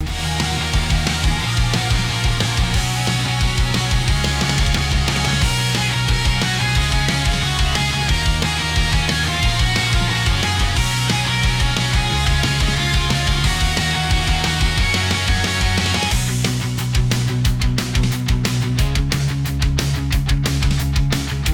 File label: loop